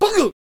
Banner Bearer Death Vocals

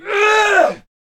Pike Death Vocals

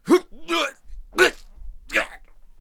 Death Vocals; Shogun

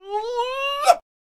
Attack Vocals

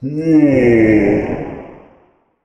Reaper Death Vocals

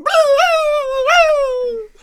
Cannon Death Vocals

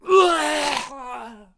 Ice Archer Death Vocals

